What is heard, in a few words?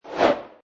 Cartoon Whoosh, Object swoosh past or zoom overhead; Comedy/Cartoon; Funny Sounds